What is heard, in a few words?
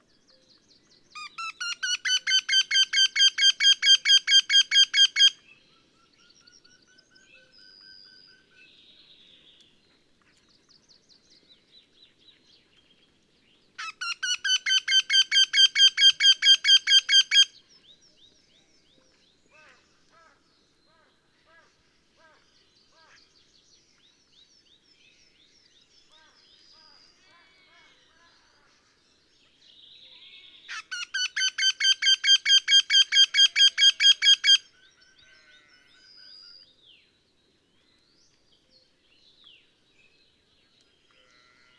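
vertisheyka voice, wryneck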